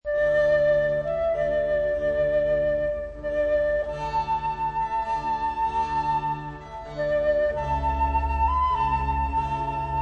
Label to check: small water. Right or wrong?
Wrong